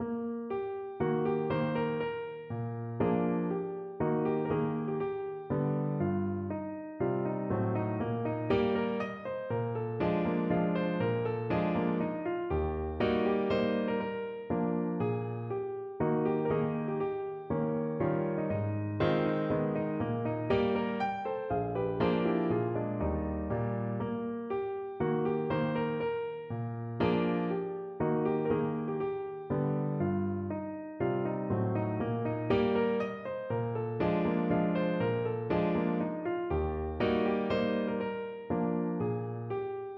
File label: Waltz, piano